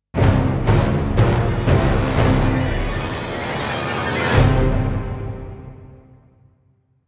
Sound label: Epic Orchestral Stinger